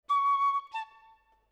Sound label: Orchestra, Jazz for piano